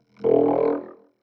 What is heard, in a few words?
120 Neo-Wave Vocoder; Pop Rock